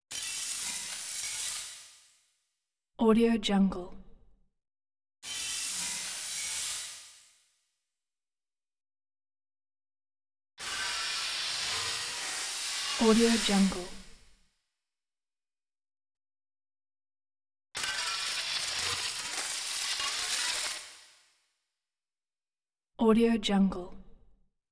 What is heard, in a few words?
glass shard graphic tinny crashing constants